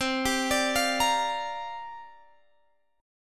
C 7th Suspended 2nd Suspended 4th C down Guitar Open G